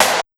HARD 130 CLP, Big Beat Drumloops; USB Soundscan vol.25; AKAI

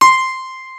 U-20 PIANO; Rubber Chicken Software Sample Bank

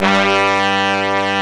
BRIGT HORN G1, HORNS; East West - Global Sounds

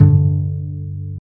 TRICKS, DBASS; Univers Sons; Jazzistic

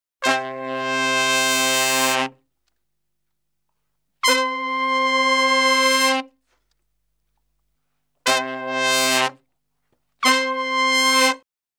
Salsa Picante; Masterbits; World Zone VOL-3

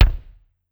Kick, Clavia DDrum AT, Pitched Down

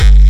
DC 909 Kik Bass, breakcore

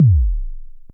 korg trident

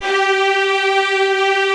ARCO STRINGS; OMI - Universe of Sounds

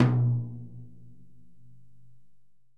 Tom pp

